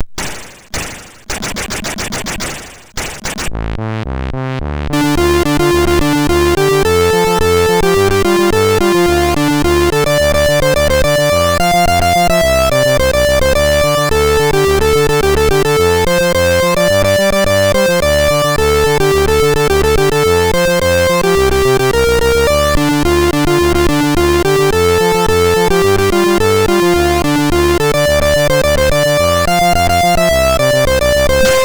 sid, MOS6581R4

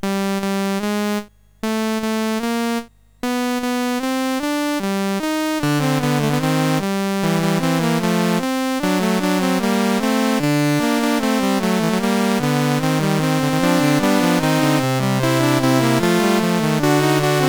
Fugue, CSG8580R5; Fugue VII 5

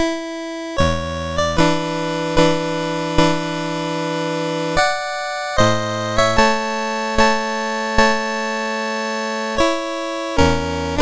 Prelude in A Major, MOS6581R4; Op28 No7

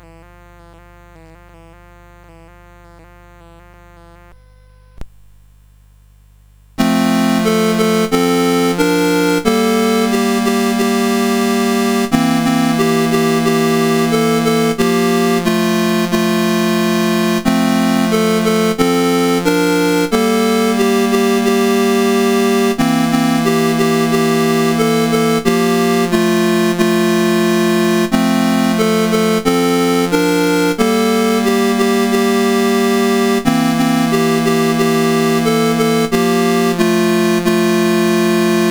Mocking Bird, CSG8580R5

